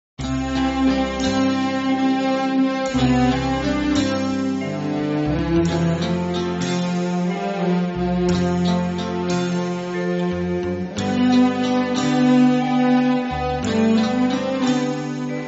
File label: Instrumental; Hum Naujawan